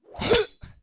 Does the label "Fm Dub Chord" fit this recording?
No